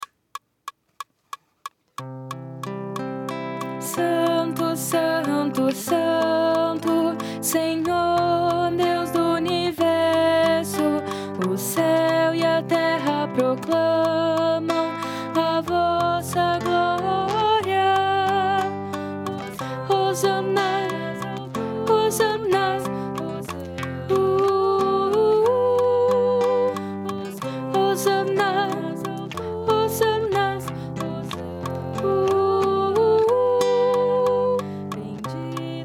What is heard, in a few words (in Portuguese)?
Contralto